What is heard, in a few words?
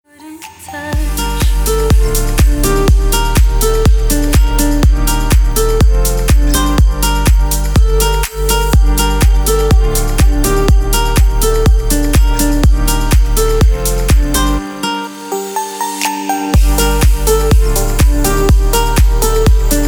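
funny ringtones for phone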